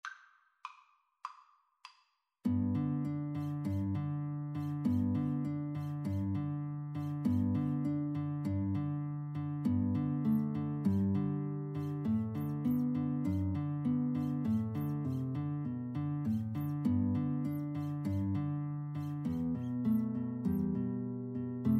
Largo from the New World Symphony for Violin-Guitar Duet